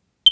firex smoke alarm padc240 beep beeps chirps low battery